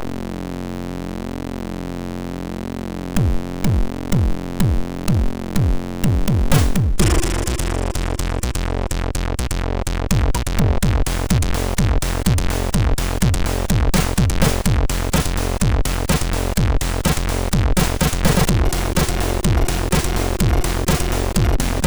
Loading sid MOS6581; hvsc

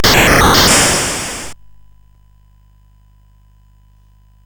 CSG8580R5, GAMES; Street Rod

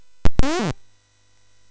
Shuffle, MOS6581R3

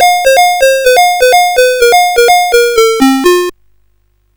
Quari, CSG8580R5

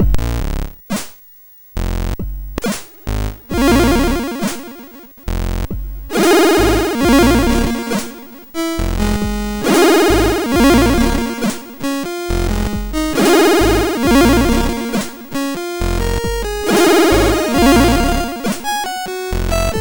Mini Blues, MOS6581R2

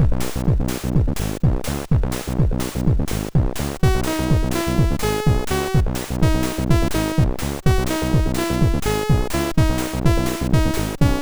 Folklor Techno, MOS6581R2; Mercury

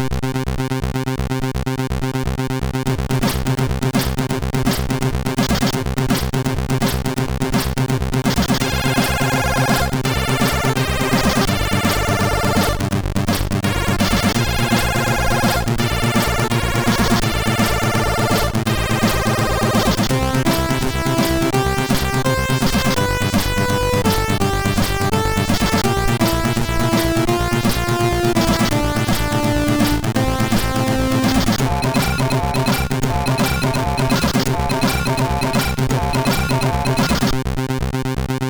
Star Power III, MOS6581; MOS6581R3

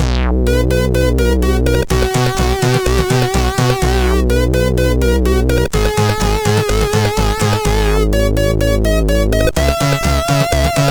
Intro Tune, CSG8580R5

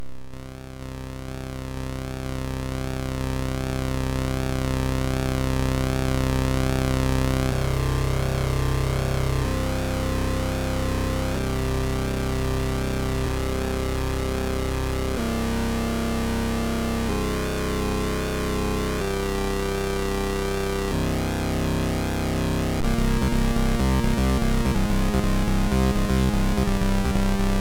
Electro Gates sid MOS6581R2

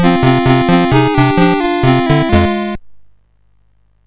sid MOS6581R4, GAMES; Forest of Doom

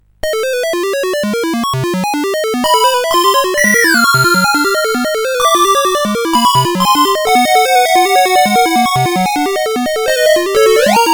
Musicbox, sid CSG8580R5

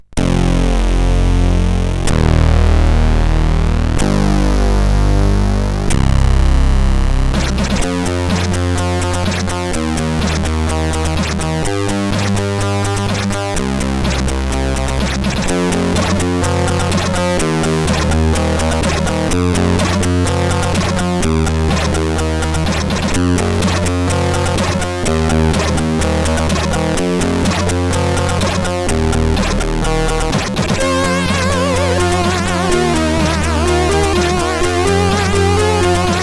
Hardbeat sid CSG8580R5

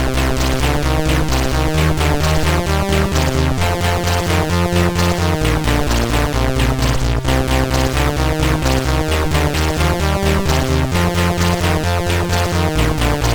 Dingdong, CSG8580R5

